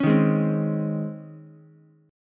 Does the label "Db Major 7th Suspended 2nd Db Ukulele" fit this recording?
Yes